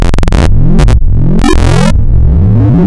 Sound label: FM Simple NonSine, wavetables, Frequency Modulation; Architecture 32